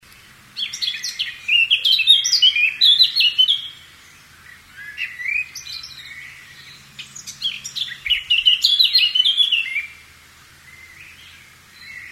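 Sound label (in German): Zaunkönig gesang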